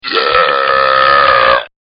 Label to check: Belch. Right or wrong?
Right